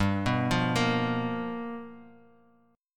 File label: G 7th Sharp 9th G, Guitar Standard; G7#9 arpeggiated